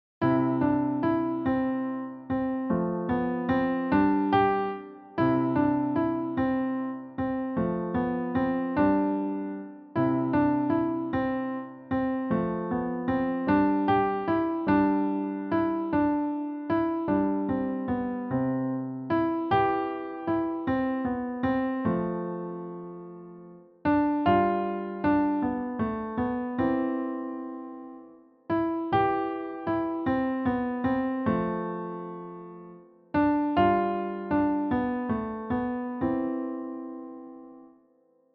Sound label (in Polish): fortepian; Pachnacy gosc; 9 swiatecznych i zimowych melodii na fortepian cz II